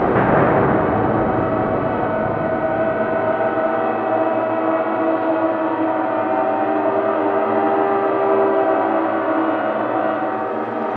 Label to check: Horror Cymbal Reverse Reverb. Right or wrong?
Wrong